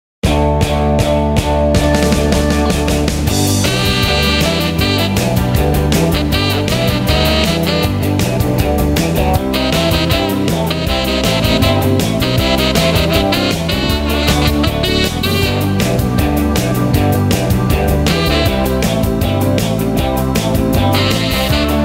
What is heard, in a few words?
sax